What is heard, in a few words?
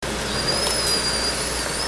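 call, Learning Orca Calls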